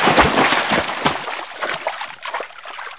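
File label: jump in water, impact; SINDEMO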